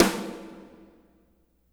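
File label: verb snare, verby kit; Spectrasonics; Burning Grooves CD2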